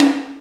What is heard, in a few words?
PERC; ZERO-G; Datafile